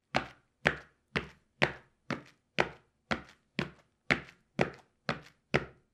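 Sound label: footsteps sound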